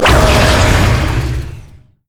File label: grasping roots; Zyra